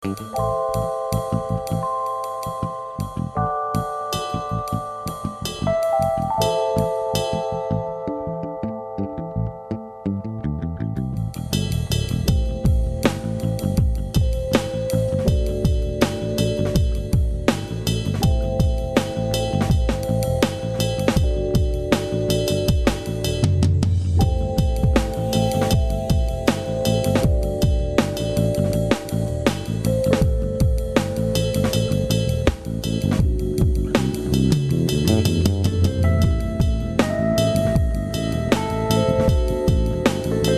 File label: ambient